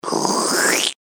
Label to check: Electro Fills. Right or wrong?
Wrong